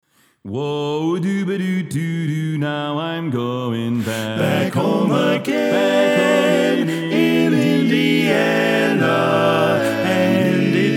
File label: Tenor Left